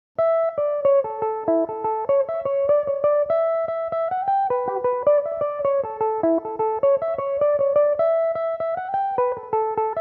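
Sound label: A mixolydian, irish mandolin, tunes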